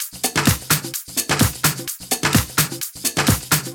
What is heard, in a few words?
Minimal Loops